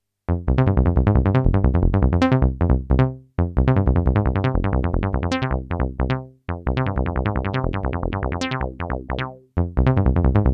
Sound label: JX10 filter bass Saw